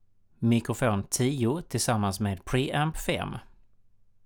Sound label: mic pre